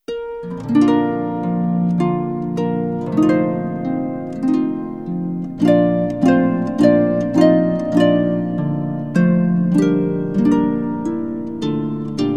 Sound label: Concert Variations on Adeste Fidelis